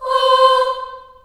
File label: C4 child AH, choir; CHILD AHS